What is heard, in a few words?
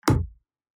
unleash arrow